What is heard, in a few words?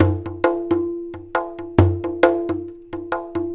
140 TABLAS, Indian Traditions; USB Soundscan vol.45; AKAI